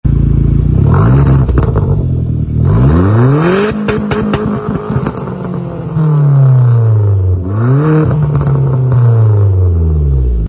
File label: Exhaust silencer